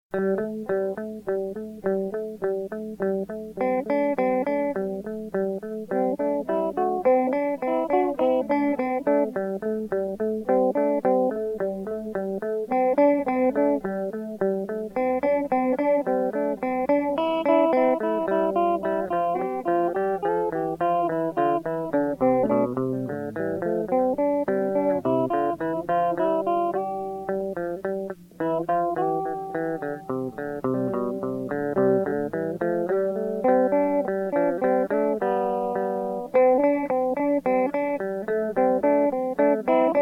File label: Tick Tock